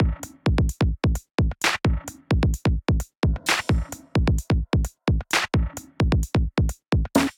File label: Kit 130 bpm, Drums; MIDI Clips; Night Shade Kit; Ableton; Factory Packs; Skitter and Step